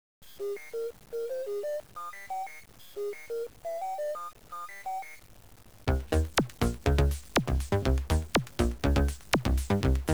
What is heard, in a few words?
8bit dither